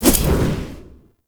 Sticky Bomb Throw; Grineer; Veilbreaker

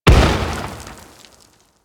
Close Step, enemies steps; Teralyst; Warframe; Eidolon

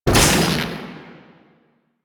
Hits Console; Warframe; minigames; Caliber Chicks minigame; boss

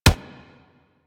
Weapon Punch Rifle; Warframe; Weapons; Rifles

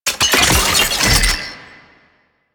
Scan Pillar Plant Open; DE; Warframe; Warframes; Frumentarius; ScanAbility

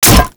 Light Glaive Catch; Warframe

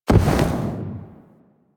Enter Aim Glide; Warframe; Tenno

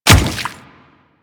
Water Step, Footsteps; Warframe; Grineer Royal Guard

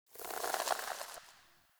Fey Eldveil Foliage Growth, Powers; Eldveil Stillness

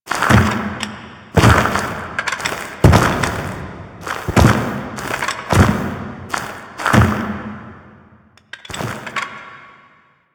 Foley; Ballas; Warframe; The New War; Fight Intro

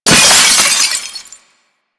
Generic Glass Break; Warframe

